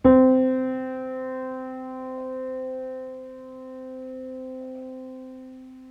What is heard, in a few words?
piano DO